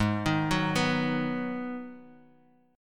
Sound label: Ab Minor 7th, chord; arpeggiated; JGuitar